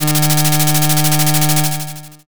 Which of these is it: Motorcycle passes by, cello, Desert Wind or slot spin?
slot spin